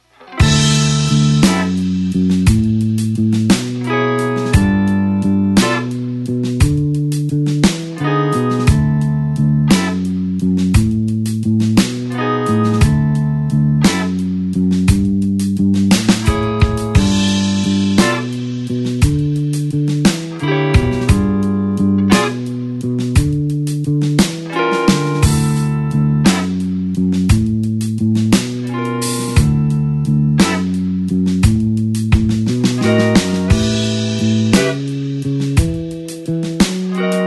blues slow backing slide

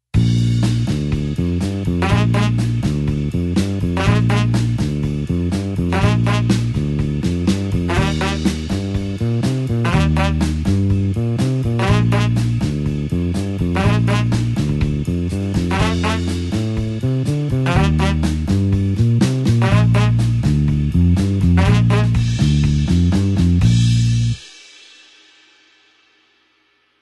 blues horns 8beat Bb

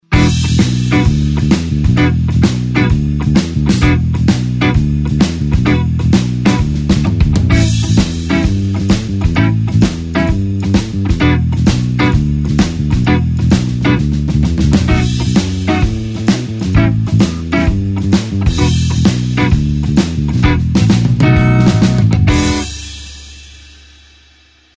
blues shuffle basic pattern E

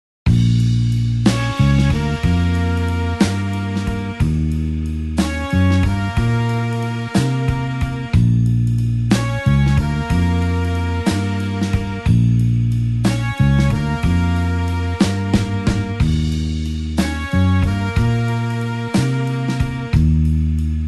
blues horns slow Bb

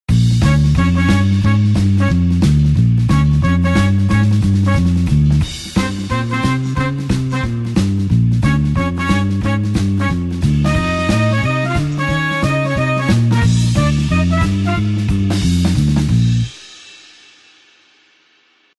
blues horns up Bb

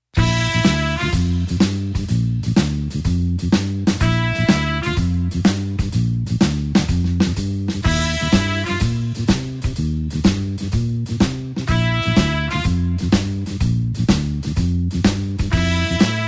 blues horns shuffle Bb

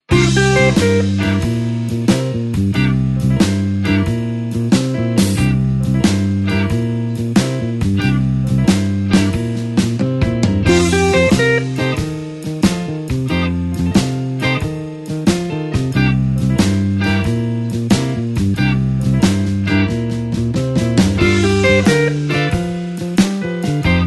blues shuffle solo C call

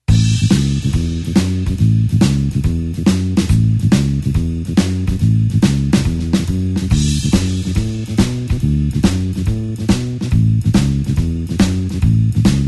blues bassline shuffle A

